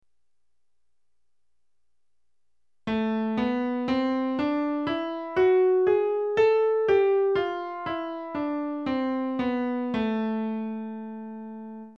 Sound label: scale